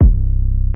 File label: kicks heartbeats